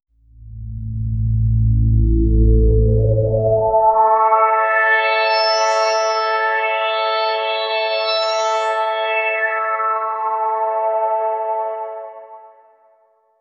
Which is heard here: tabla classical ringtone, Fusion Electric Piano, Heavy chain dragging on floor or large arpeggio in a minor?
large arpeggio in a minor